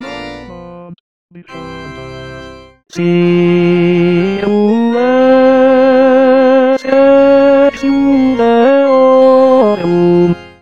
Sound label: tenor